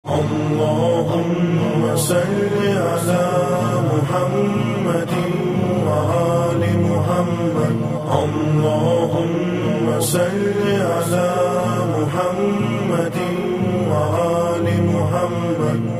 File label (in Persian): جمع خوانی صلوات; پس زمینه صدای; بسیار زیبا